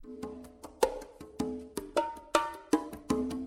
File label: Congas